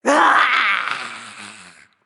lobby landing; smite; god scylla

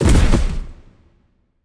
bodyfall; Smite; god Khepri

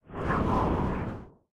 float; smite; thanatos

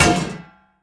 shield drop; smite; lancelot

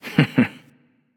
Heh heh; smite; susano

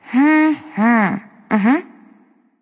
thinking; smite

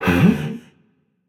confused, voicepack; smite; jorm

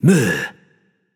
nv tongue; smite; voicepack; hachiman

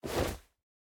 jump; smite; merlin

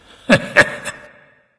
hehheh; smite; ganesha

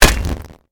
hit; smite; chaac

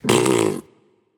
tongue; smite; voicepack; jingwei

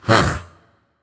confused; smite; ahpuch

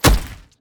whip hit; smite; osiris

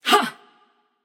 grunt attack passion; chaac

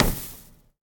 Clap; Smite; god Kumbhakarna

